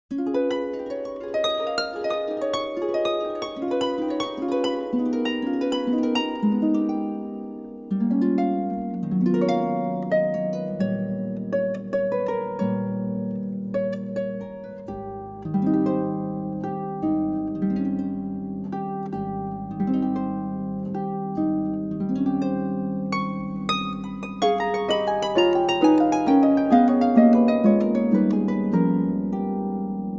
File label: Waltz